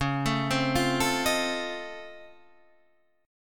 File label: C sharp Augmented Major 9th down Guitar Half Step Down, chord; C#+M9